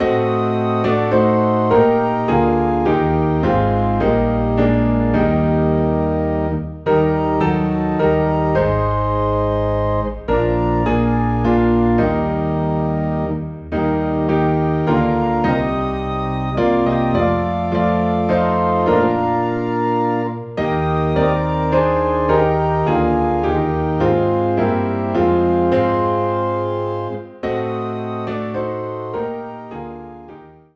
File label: Piano and Organ in E Flat